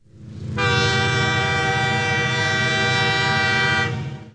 train horn; ambient; machines; Counter Strike Model Pack; CS:GO

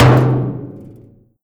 impact, metal barrel; physics; props

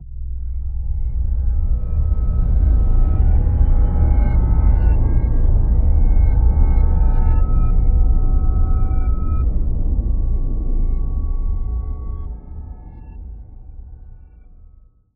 creepy low; ambient; lfe; Half Life Alyx

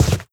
scuff, footsteps cardboard

